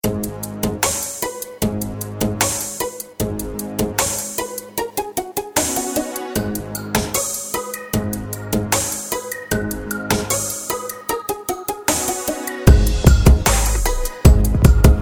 Wrong Melody

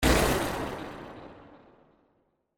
Blast

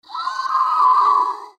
monster scream

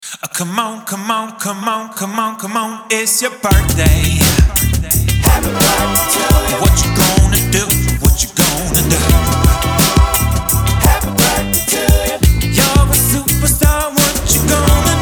happy funky birthday

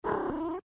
cat purr meow

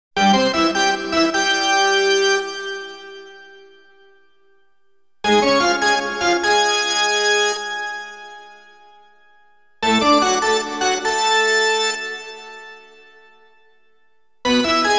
Charge, modulating; Soundzabound Volume 2 Disc 1

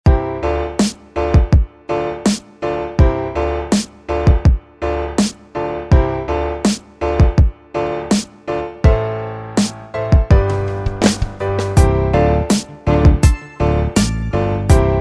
Beat One

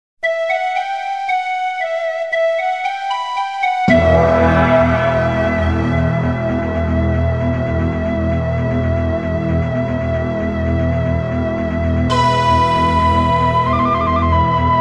Dance of the Arabian Princess; Soundzabound Volume 2

